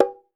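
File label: African hand drums; Voice Crystal - Steve Reid Definitive Percussion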